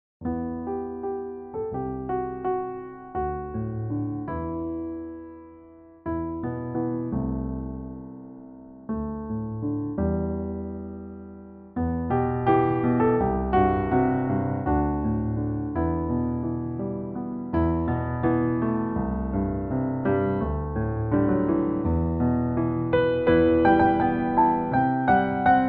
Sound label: piano; musics